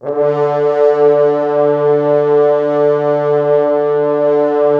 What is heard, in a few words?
Orchestral Brass